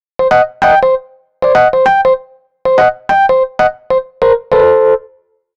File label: Keys Line 97 C, 97bpm, hiphop; musicradar